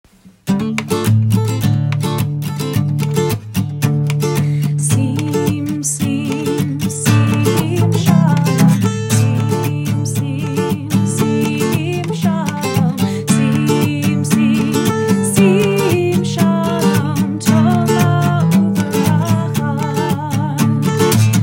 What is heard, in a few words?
Sim Shalom Folk